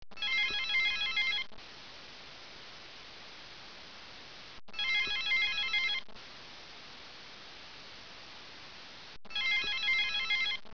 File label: telephon; cs rats yo